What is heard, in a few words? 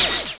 gunfire